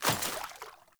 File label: Water Step; Characters; Ambient; Steps; Sprout Farmers